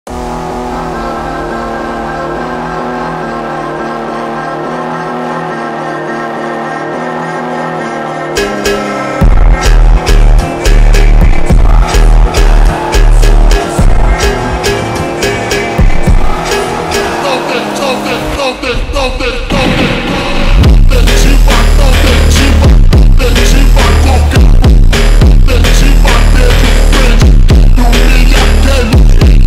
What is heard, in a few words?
slowed reverb